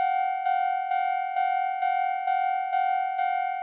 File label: Railroad Crossing Signal Loop